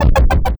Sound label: stairs down; baconthulhu